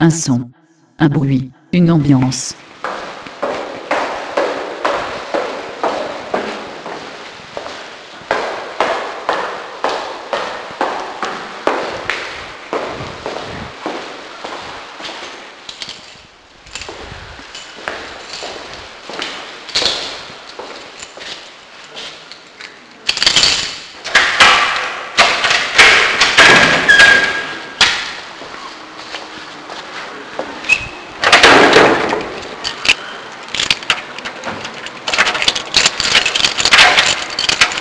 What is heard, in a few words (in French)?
Un son un bruit une ambiance